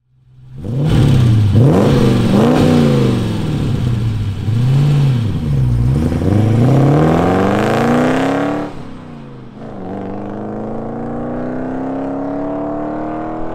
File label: Alfa Romeo Montreal Sound